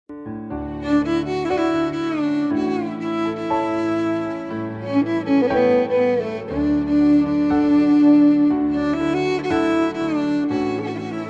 flute ringtone violin ringtone flute

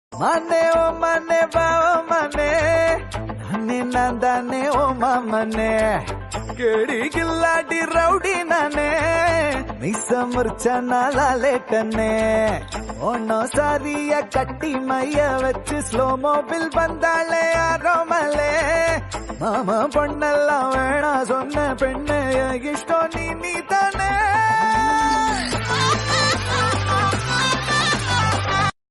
song ringtone trending tamil ringtone kerala bgm

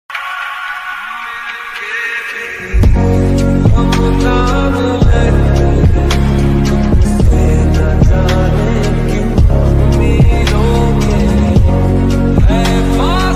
lofi remix ringtone love ringtone

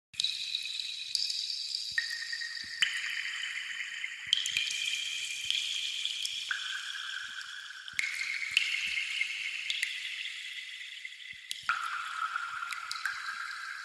water drop sound effects relax calm; iphone ringtone sms ringtone

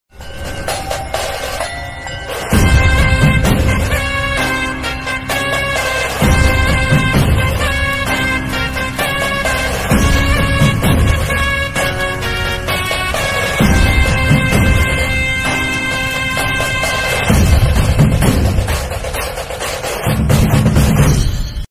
song ringtone bgm; kubera; dhanush; raayan bgm

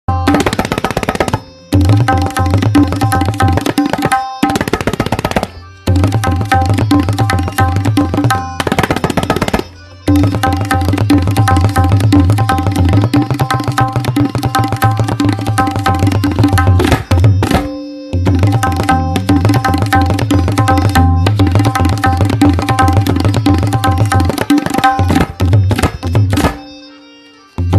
classical tabla, tabla; rau rela; purab ang; tete rela

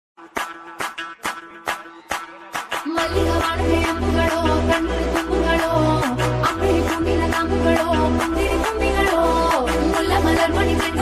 bgm; pushpa 2 the rule

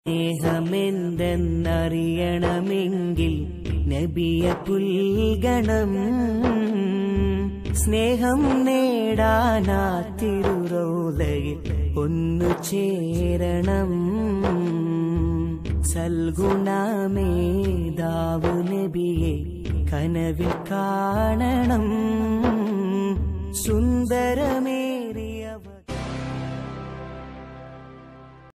malayalam islamic ringtone

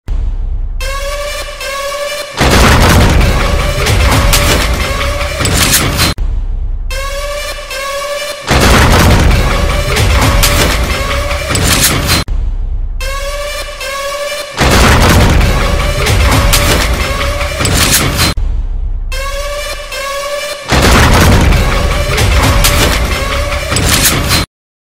angry bgm; naa peru surya naa illu india

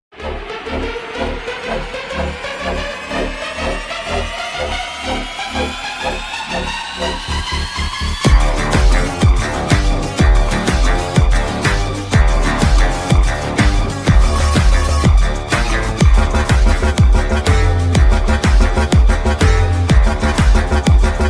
single loop animal bgm, 4 in 1 mix animal bgm; steinesfx

